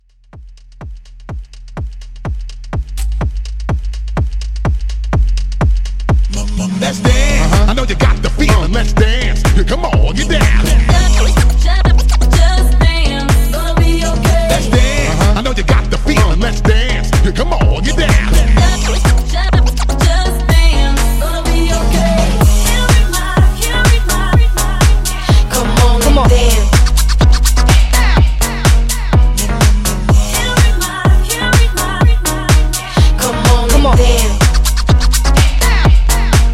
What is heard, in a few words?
House Partybreak Intro 125